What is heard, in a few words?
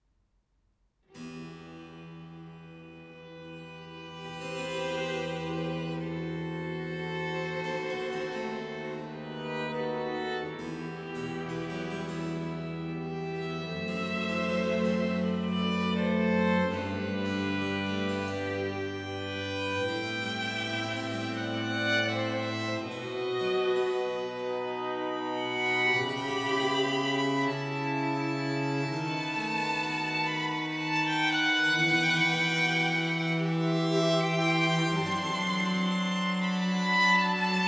Concerto for Strings in E minor, Largo; Antonio Vivaldi